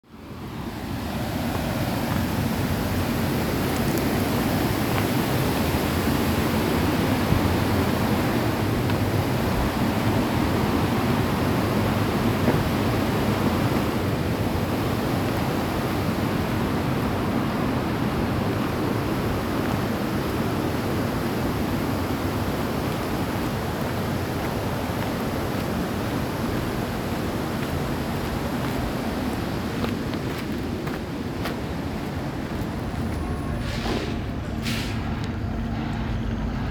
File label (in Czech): ruch